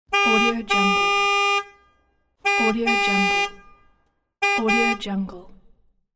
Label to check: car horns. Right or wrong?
Right